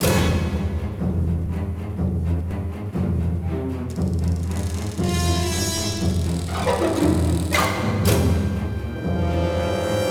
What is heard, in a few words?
Boss combat; Bosscombat Ch06a 01